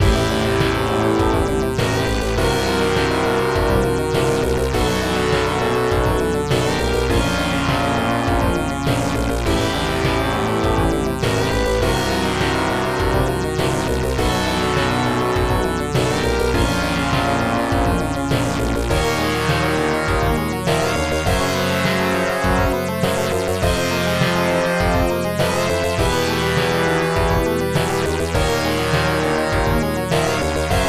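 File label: background music; Varth - Operation Thunderstorm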